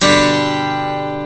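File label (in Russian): гитара аккорд соль мажор g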